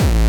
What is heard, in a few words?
BD Distortion, Bassdrums; Vengeance Samples